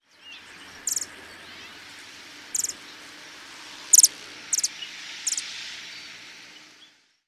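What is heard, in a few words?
calls, Calliope Hummingbird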